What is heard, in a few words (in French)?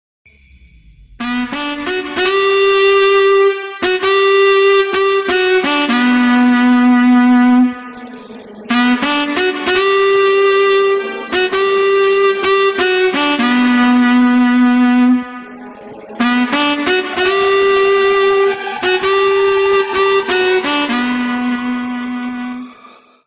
musique